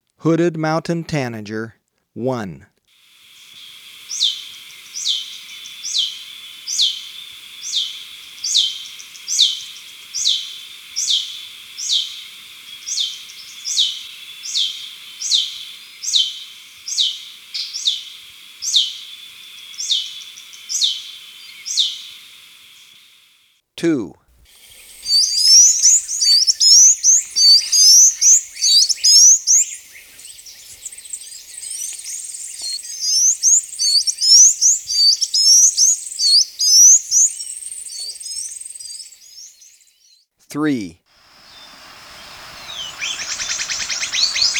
Hooded Mountain-Tanager, Birds of Ecuador; S. America

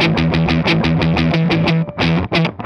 Hard Rock Guitar; Guitars

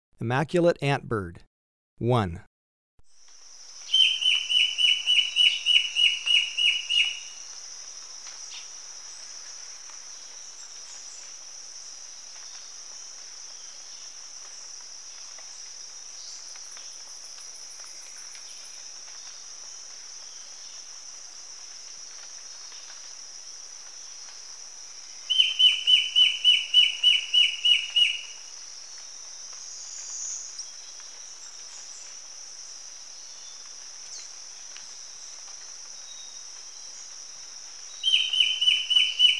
Immaculate Antbird, Birds of Ecuador; S. America

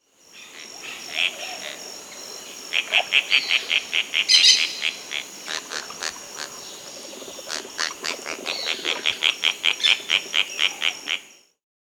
Black-tailed Tityra (Tityra cayana), Peru; S. America

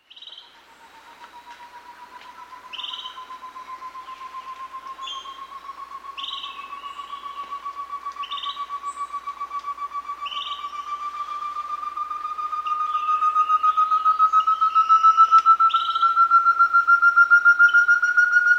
Such's Antthrush; S. America

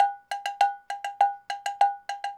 cowbell 100 bpm, latin; Sound Effect Library

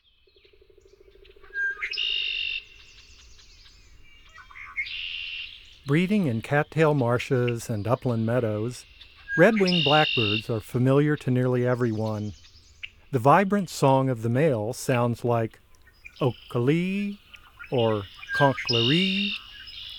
Red-winged Blackbird; North America; Common Western Species; Know Your Bird Sounds

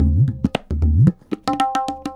tabla funk 110

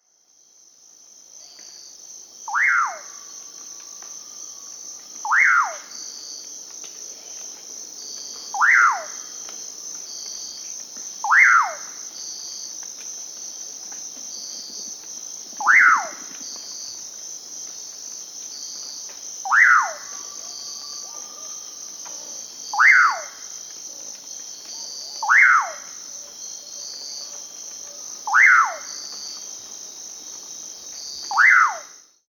Pauraque, Nyctidromus albicollis, S. America

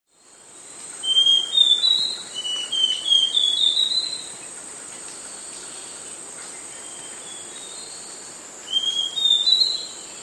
Northern Chestnut-Tailed Antbird; S. America

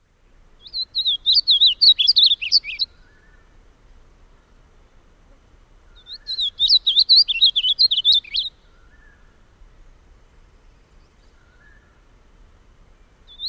Gray-Crowned Yellowthroat; S. America

